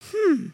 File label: curious, emotes